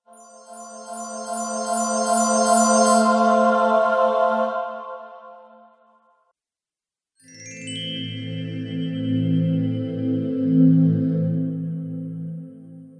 Treasure Game Win Sounds; Video Game

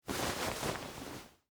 Short Sleeve Unfold, Clothes, Shirt, Over, Arm; Foley; Dressing Sound Effects; BlastWave

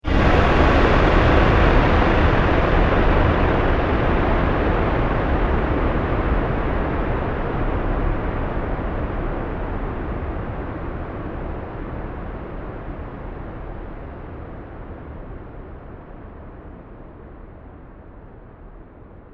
Drum Impact Movie Trailer

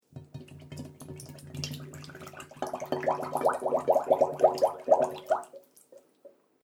Water Bubble Sounds

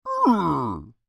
Creature Moan Annoyed, Human; Groaning Sound Effects; Voice; BigRoomSound

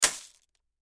Toy Bag Of Marbles Drop On Cement; Miscellaneous Cartoon

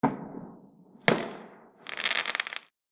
Fireworks Low Bang And Fizz; Firework Sound Effect; Celebration, Party, Holidays; Andrew Fleming

